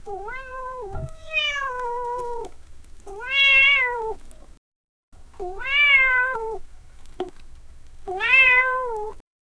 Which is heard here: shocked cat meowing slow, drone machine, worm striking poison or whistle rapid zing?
shocked cat meowing slow